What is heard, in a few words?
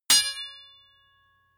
sword impact metal ring heavy